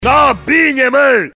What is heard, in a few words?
aha